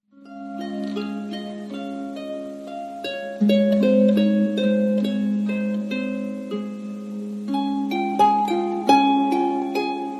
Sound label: Lullaby Excerpt, harp; Licas